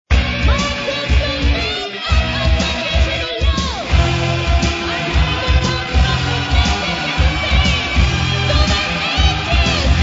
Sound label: guitars